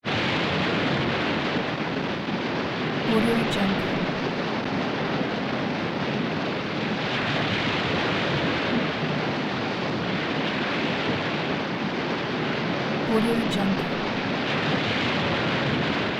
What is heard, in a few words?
heavy wind crunchy wash powerful continuous